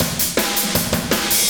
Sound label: drumkit